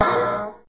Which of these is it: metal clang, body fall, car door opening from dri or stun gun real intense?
metal clang